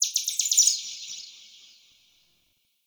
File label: Forest Bird High; Ambience; Warframe; Grineer